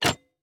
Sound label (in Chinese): 铜活板门 开关声